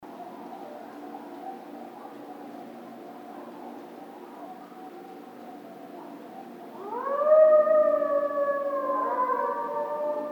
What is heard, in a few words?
howl, Coyote; Coyotes (Canis latrans)